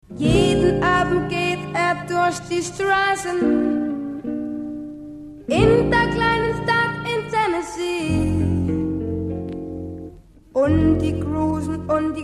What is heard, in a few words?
banjo boy